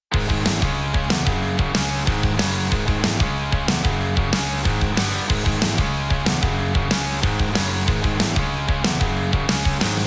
guitar off

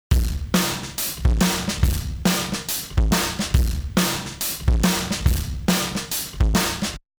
drum on

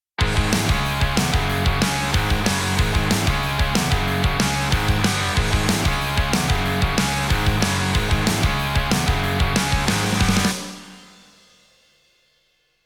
guitar on

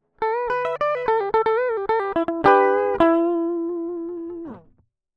guitar solo off

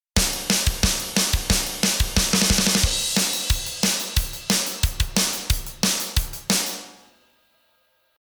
drum preset on

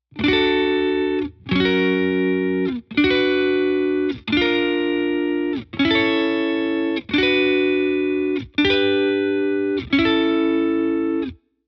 guitar off

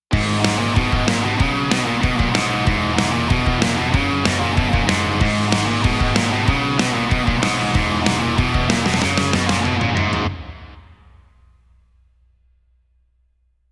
2mix Drum On

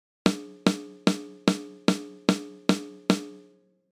snare no

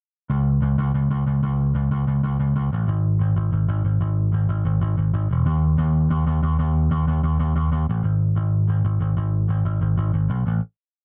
bass off

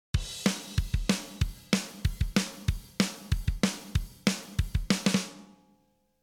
drum off

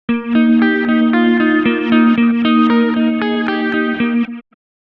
guitar on

